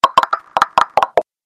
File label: monster crab